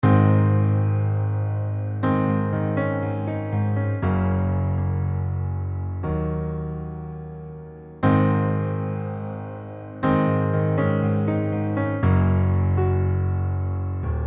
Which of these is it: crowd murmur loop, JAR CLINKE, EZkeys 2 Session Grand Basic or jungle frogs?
EZkeys 2 Session Grand Basic